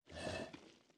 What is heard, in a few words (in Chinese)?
海龟待机声